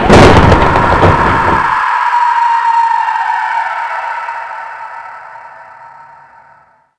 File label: stomp; tos